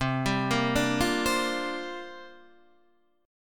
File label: C 7th Suspended 2nd Suspended 4th, Guitar, Open D